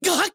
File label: Damage